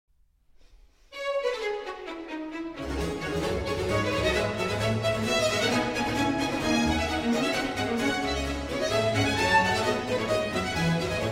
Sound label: Violin Concerto in D Minor, Allegro; BWV 1052R